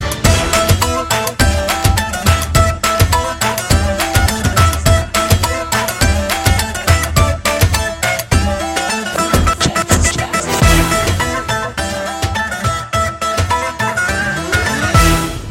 turkish melody beat, ringtones

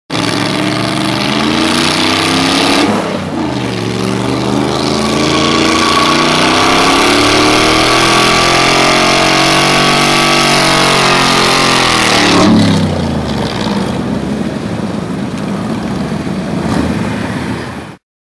Dodge Dart 67

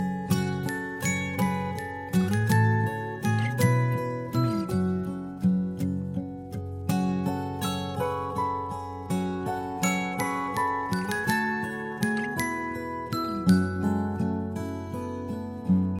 BGM

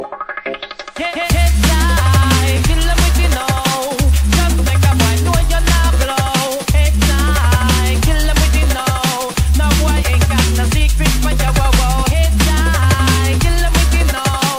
Ragga Style

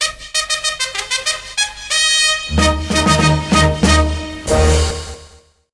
military ringtone

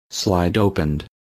Hiphop slide Open

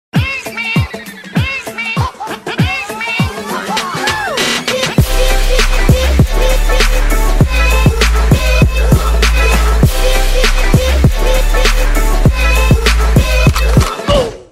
Trap Remix; Pingu

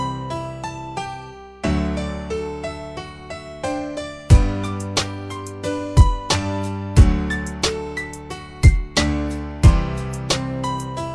Beat Romantico

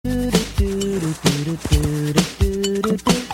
Woosh

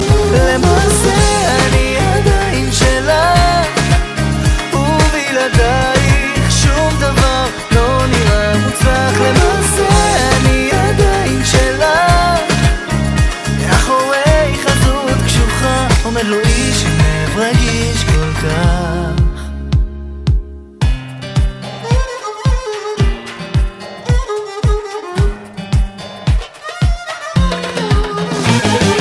Hebrew song

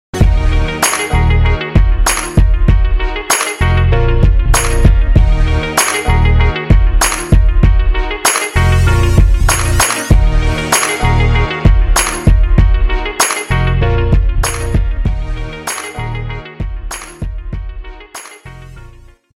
Trap in Call

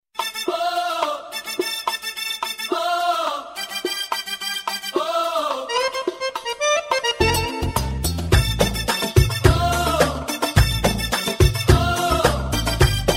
arabic belly dance

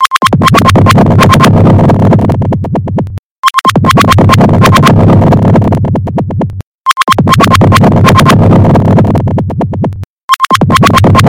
Underwater Ringtone

